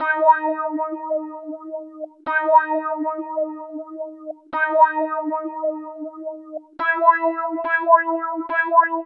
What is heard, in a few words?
Wonder Wah Wah; Sonic Foundry (Sony Creative Software); Club Joints and Street Anthems; Lions Den